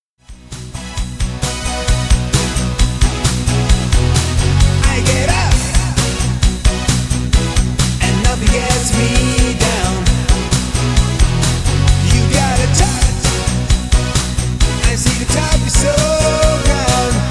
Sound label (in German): Rock Medley; Tanzband